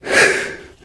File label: launch moan; eli; Portal 2; Source Engine; video games